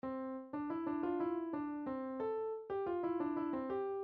germany anthem, midi

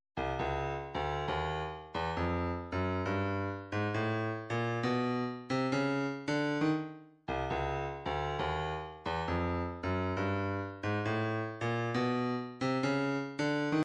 separated elements snare; 135 Wide Shut

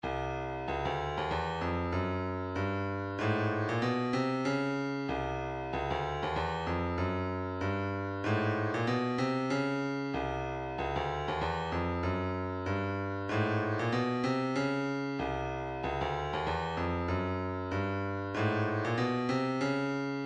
vocal planet deep breath, midi

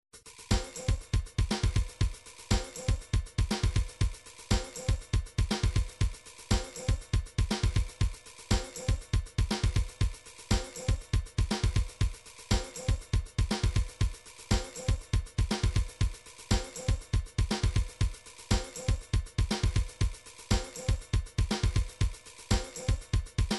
drums, midi drums

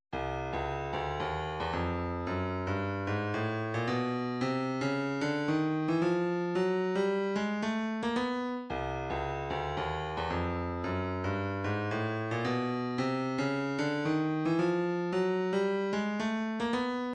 112 snare

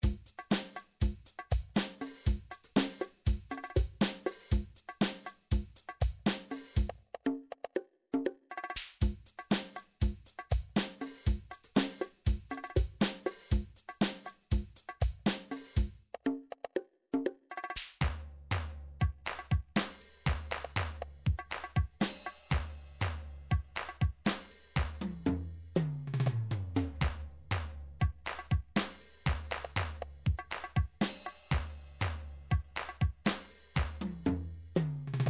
drum midi files 9 8 turk

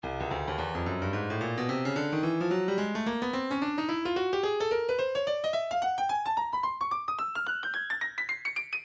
breakbeat midi 109 bpm; soho c; Stylus Breakbeat Midi Files

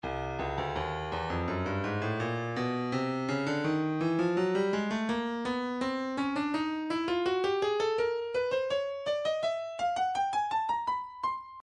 breakbeat 083 bpm; Stylus Breakbeat Midi Files; breakers a

